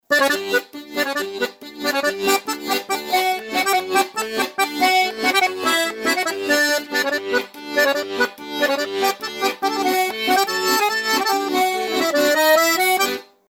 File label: accordion